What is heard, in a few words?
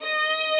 violin